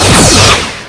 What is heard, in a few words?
Klingon Green Torpedo